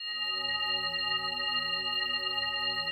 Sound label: PAD, DIGI-PAD; Best Service; XXL 800 Pads; Sample CDs